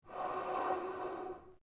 Gasmask Exhale; Sid Meier's Civilization 4 Complete; Beyond the Sword; Units

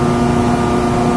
flourescent room loop, ambience; ambient

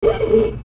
mech servo; Sid Meier's Civilization 4 Complete; Beyond the Sword; Units

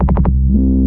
combat scan, scanner; Garry's Mod; hl2

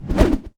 knife pass by; Mount&Blade Warband

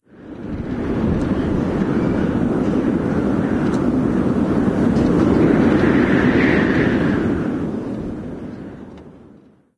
random wind gust; random amb sfx

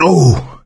hurt minor, survivor voice; Left 4 Dead 2; coach

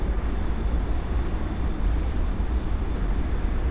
big warehouse amb loop; mill; Counter-Strike Global Offensive

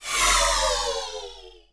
scout run; Sid Meier's Civilization 4 Complete; Beyond the Sword; Final Frontier; Units

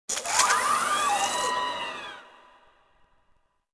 City Noise; Ambient; Sid Meier's Civilization 4 Complete; Beyond the Sword

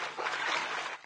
wade, footsteps walk; Left 4 Dead 2; boomer

